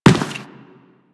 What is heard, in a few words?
Run Default, Footsteps; Enemies; Warframe; Teshin